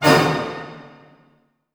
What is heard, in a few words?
A MIN FF, orchestra hits; Sound On Sound - Peter Siedleczek's Orchestra